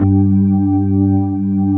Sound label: B3 HAM, Keyboards Old School; USB Soundscan vol.09; AKAI